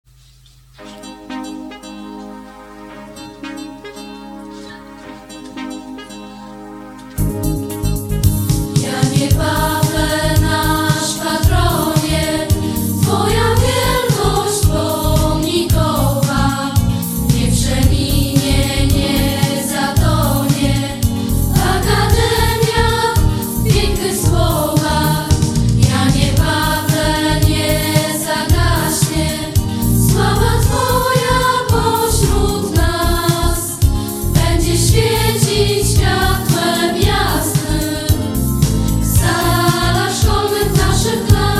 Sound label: Hymn; Janie Pawle